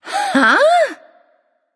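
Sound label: confused, female; smite; voicepack; hera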